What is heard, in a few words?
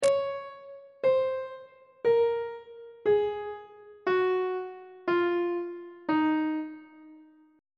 e flat dorian mode descending; on treble clef